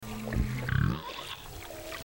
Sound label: social sounds, cetos